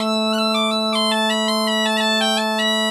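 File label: bagpipe 160, Celtic Flavours; USB Soundscan vol.42; AKAI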